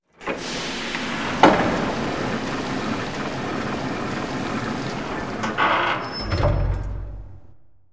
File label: Rotate Counter; moul